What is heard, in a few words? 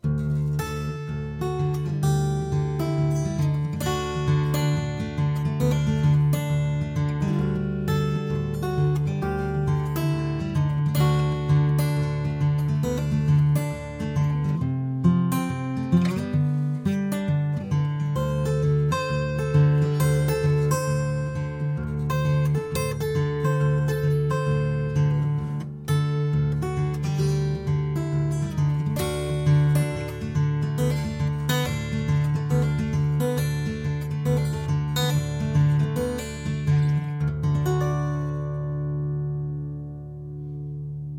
allround jumbo, guitars